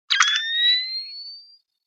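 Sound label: a new message tone; blues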